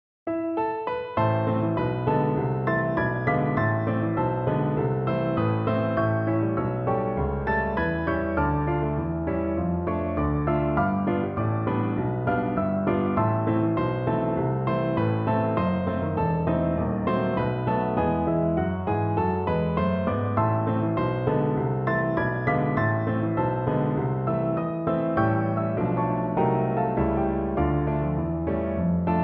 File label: quatre mains, piano duet